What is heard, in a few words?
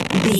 foley leather stretch couch chair